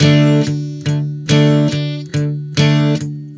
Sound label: Strum 070 D, One Chord Strumming 070 bpm; Sonic Foundry (Sony Creative Software); Songwriter Acoustic Guitar Companion